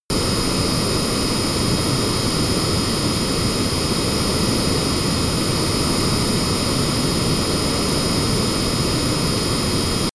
plane honda private small turbine full power direct